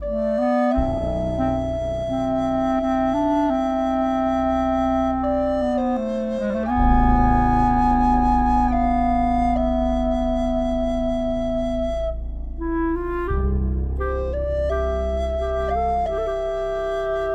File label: boss intro intensity loop 172bpm; chicory a colorful tale; ps4